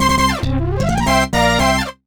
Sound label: Airhockey; Table Game Spirits 2; DS